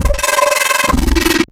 Wavetable Screaming Leaves; Synthetic's Serum Collection; Audeka Wavetables

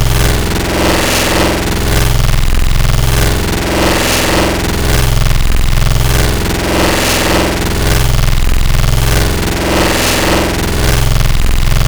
Growl Machine Gun; Wavetables; Synthetic's Serum Collection; ESW Core Wavetables; Echo Sound Works Core Tables

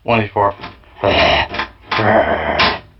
Broken Cello

